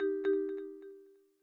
Low Battery; Meitu T8

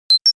NFC Success; Nothing OS 1.5

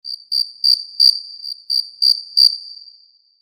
cricket, notifications; Lenovo A390

